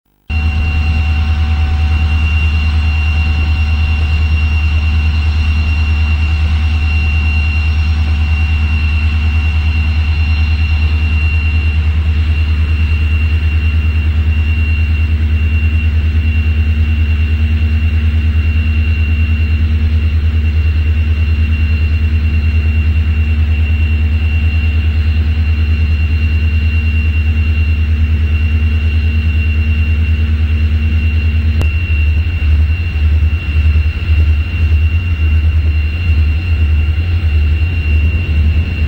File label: DSB Me 1505; Vordingborg 2009